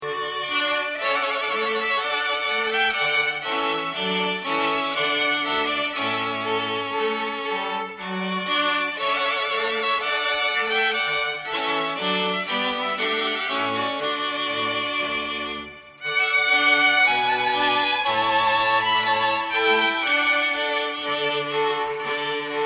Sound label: String Quartet